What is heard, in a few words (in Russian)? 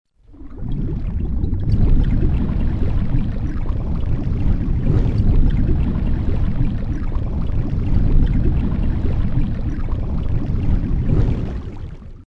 zvuk burljashhej vody u podvodnogo rifa